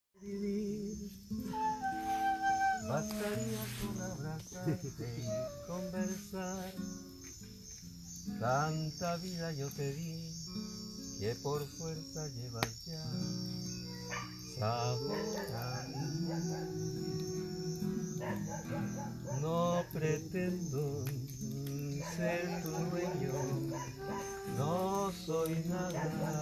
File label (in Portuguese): Musica Encerramento